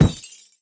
Daily Gift Collision

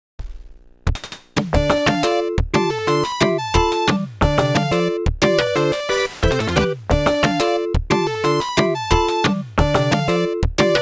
Bonus Stage BGM; Sega Arcade 80s Vol. 1

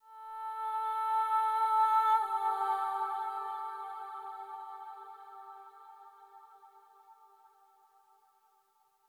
Piano Flourish; Dreamfall Chapters; White Dragon Baby

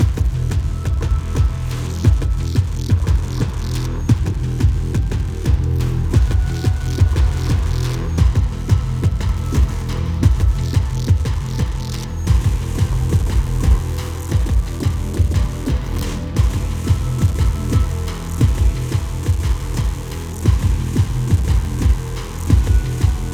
Naval Music Stutter Theme Naval Deck Fight; Battlefield 4

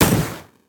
gun; Fight Knight

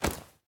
battery pickup

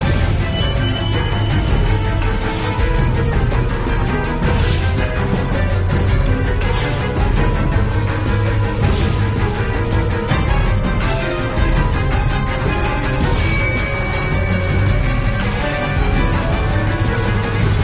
loop Action

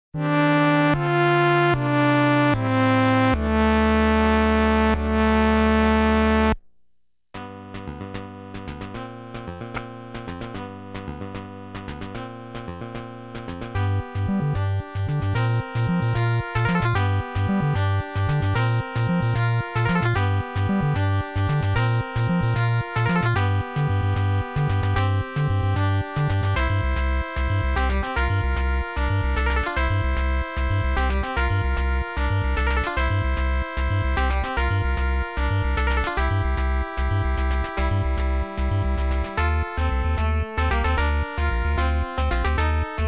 Appearance Fanfare; Super Metroid; Brinstar

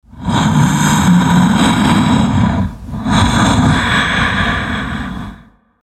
Colossus Breathing Idle

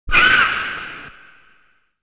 Enemy Voice (Small Bird); genshitou prehistoric isle

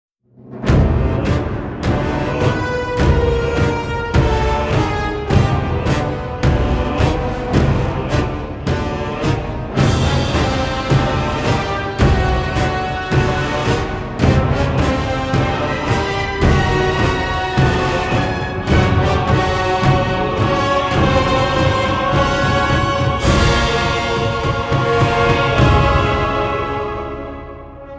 orc camp explore day

